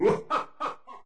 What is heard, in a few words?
Laughing; Dr Crygor